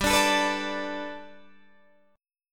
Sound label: G Major Add 9th Guitar Open D, chord